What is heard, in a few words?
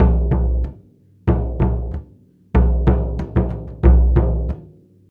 Hip-Hop Gong